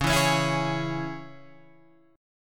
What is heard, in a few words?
C sharp Minor C sharp Guitar Open G, chord; 11,9,11,9,9,11